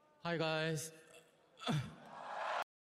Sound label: ahhuh